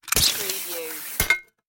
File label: Grapple Gun Shoot